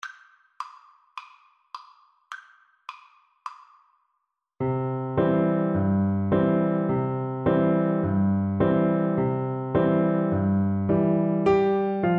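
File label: viola karaoke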